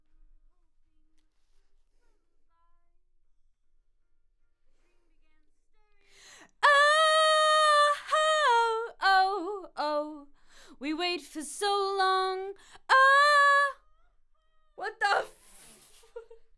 Vox start new

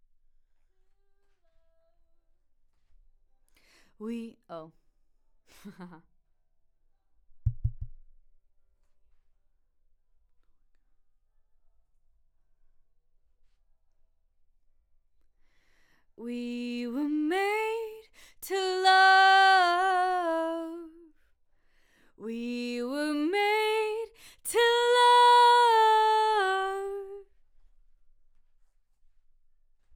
Vox new

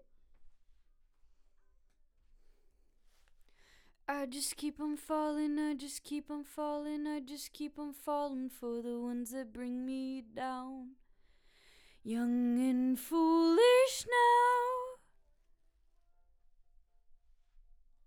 BG Vox

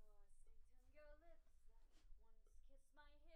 BG Vox

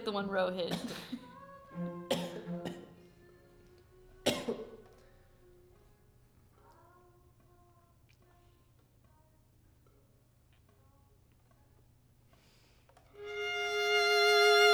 violin

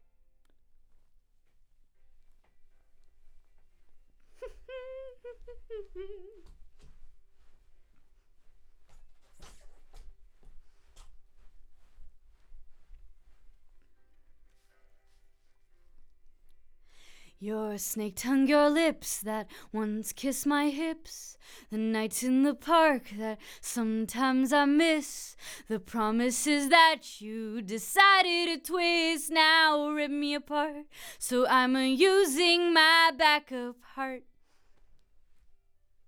Vox

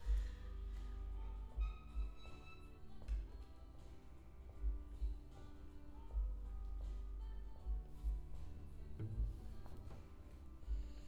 Cello

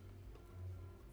Violin

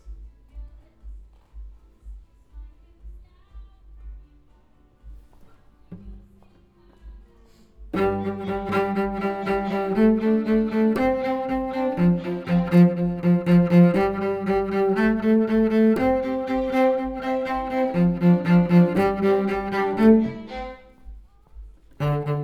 Cello

